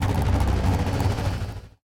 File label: projectile; Smite; god Janus